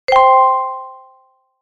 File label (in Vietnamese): Am thanh loai bo lua chon trong gameshow